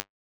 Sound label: echo filter pipe